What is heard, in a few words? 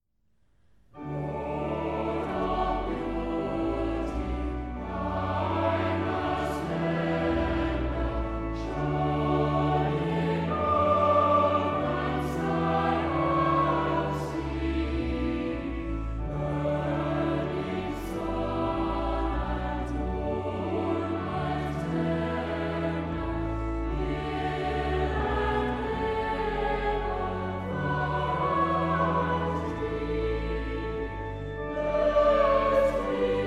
Church Choir; Lord of beauty